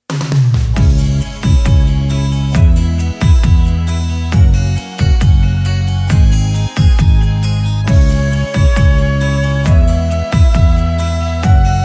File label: beat, playback